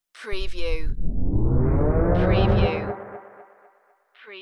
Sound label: Low Rise, Mega Sub Bass; ghoul